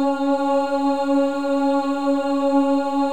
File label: OO C#4, WOMEN; Spectrasonics; Symphony of Voices; WOMEN OOS